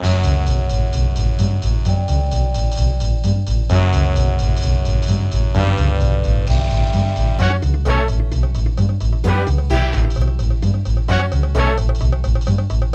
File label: Jazz; nds